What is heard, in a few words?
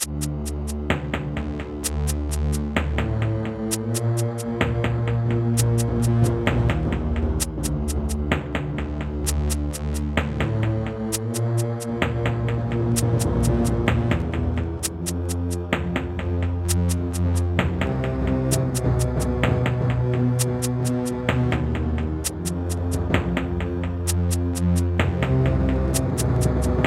Alesis Harmony 32; Descent II